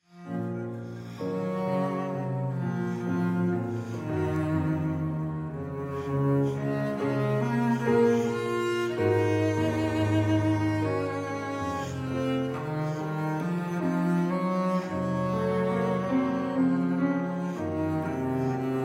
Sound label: prelude B minor; Ciurlionis